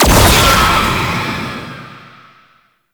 Unhallowed Curse Hit; Warframe; Dagath

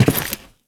Step Default, Footsteps; Alad V

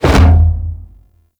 Breakout Hit; Amalgam Carrus Pilot

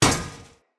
Sphere Polearm Catch, Weapons; Player

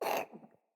Kitten Cough Hairball; Catbrow

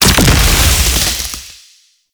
Grenade Explosion; Warframe; Lasrian Soldiers; Weapons; Lasrian Goonadier Grenade

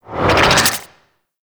Tendrils Cast; Warframe; Infestation

